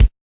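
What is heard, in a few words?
crunchy kit kick; STYLE KITS (Hip Hop, Etc)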